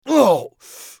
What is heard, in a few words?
bear wound; eft